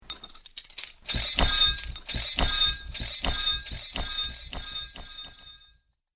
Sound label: Metal Chains Magic Ambience; Chains Sound Effect - Clink, Clatter, Clang, And Clink Are The Sounds That These Chains Make.; Andrew Fleming